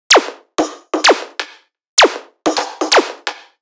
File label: perc 128, Electro percussion loops; Anthemic